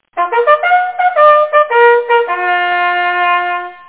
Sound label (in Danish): loes hundende, jagthorn; Askov Malt Jagtforening